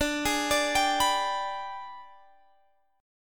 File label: Ab Minor Major 7th Flat 5th chord